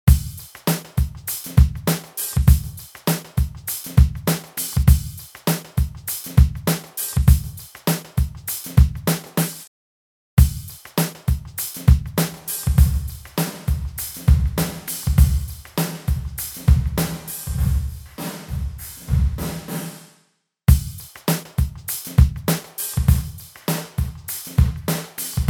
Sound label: Reverb; 112dB Redline Reverb; MPC